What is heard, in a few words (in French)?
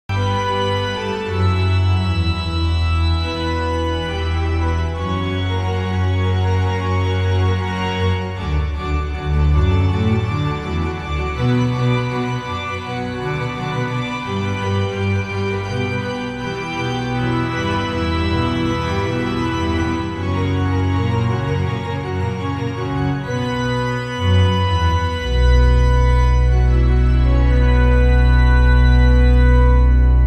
Fugue, Orgue